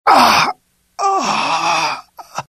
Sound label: death, pain; mohaa; dialogue